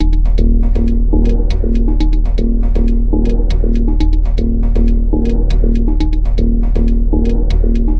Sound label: gorgeous complex thickness, synth and electronic loops